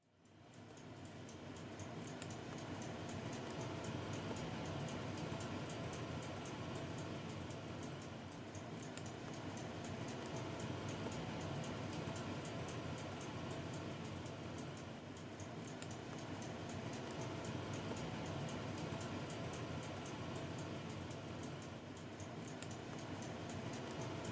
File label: clock